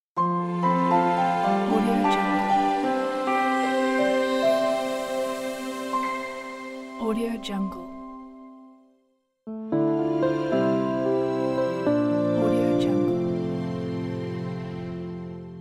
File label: romantic piano logo pack